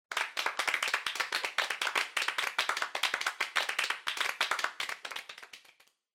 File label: Applause, Far Mid